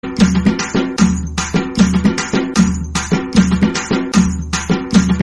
african drums ringtone

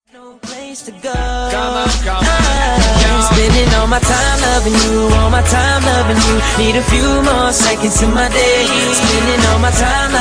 amazing samsung ringtone

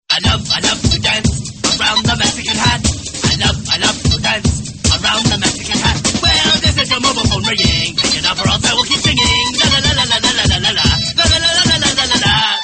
mexican dance tone

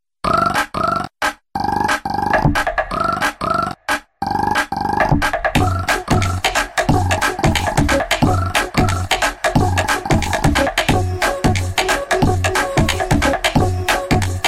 samsung galaxy note 8 ringtone; happy jungle

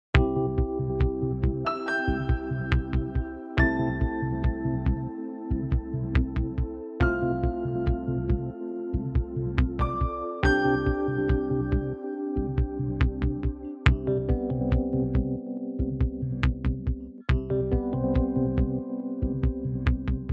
melody ringtone

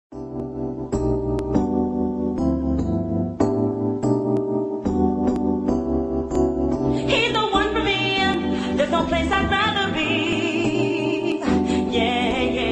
pop ringtone

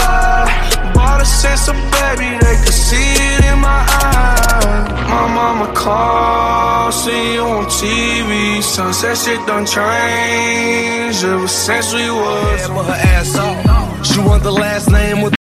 new hip hop ringtone

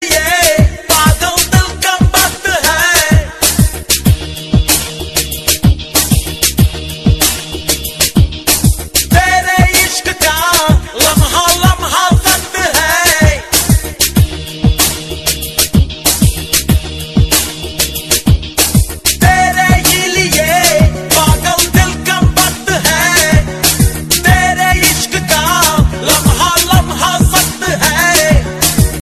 bollywood ringtone

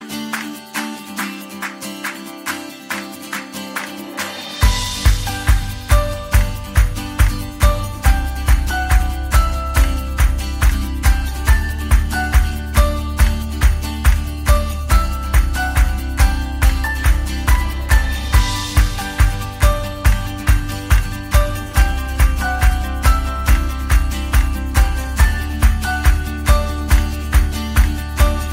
guitar energy ringtone

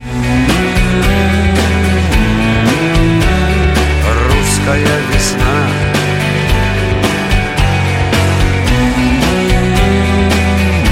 russian ringtone

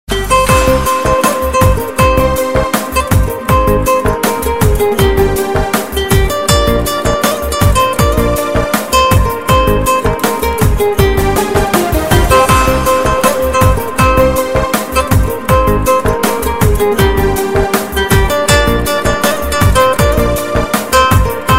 bollywood ringtone

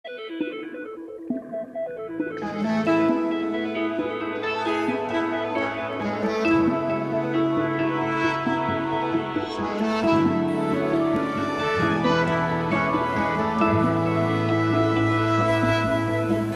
ringtone

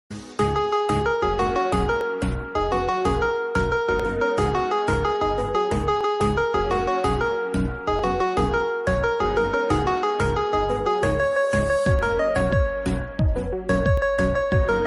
instrumental ringtone

